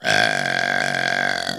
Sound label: burp medium